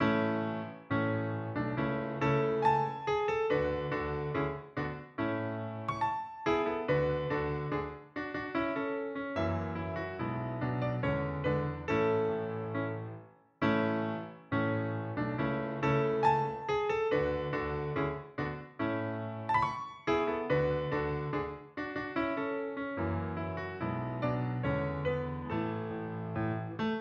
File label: Piano Music